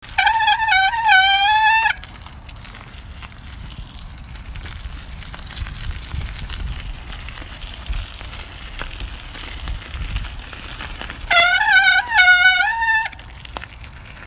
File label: Cycling Brakes